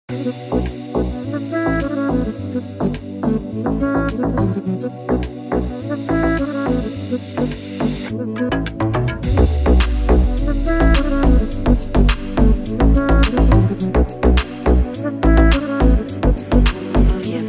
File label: ethnic deep house instrumental